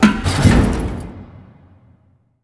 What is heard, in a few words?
Pressure Plate Down